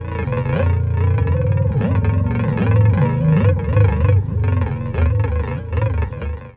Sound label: Gurgle, Weird; Zero-G Ambiosis